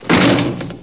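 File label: door hit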